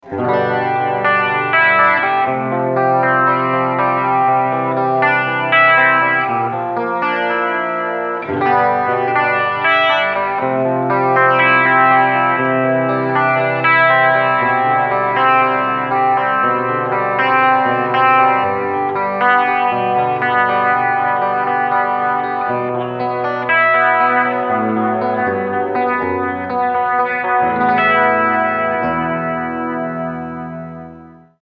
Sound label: Chorus Clean